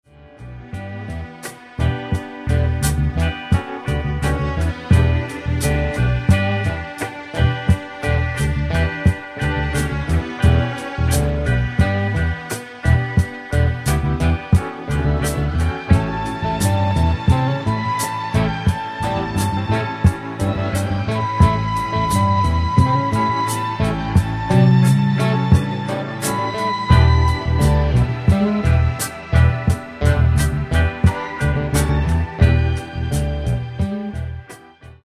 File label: With Strings